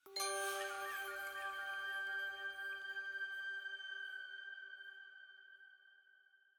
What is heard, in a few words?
Jingle Flute Chime G; Ori and the Will of the Wisps